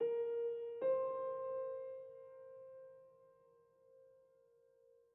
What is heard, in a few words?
Env Field Night Ground; the legend of zelda tears of the kingdom